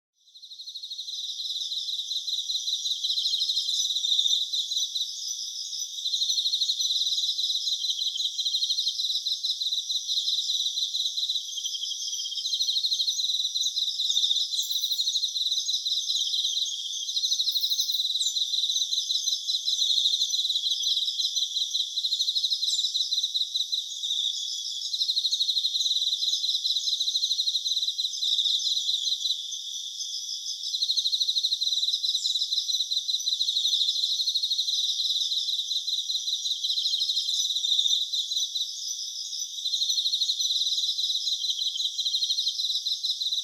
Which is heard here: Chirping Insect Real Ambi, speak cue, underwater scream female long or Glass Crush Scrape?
Chirping Insect Real Ambi